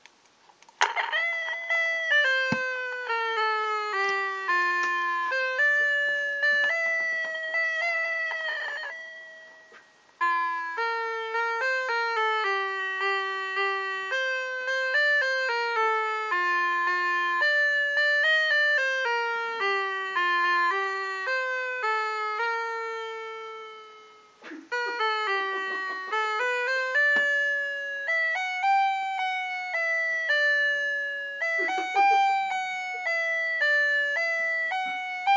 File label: sad little xmas bell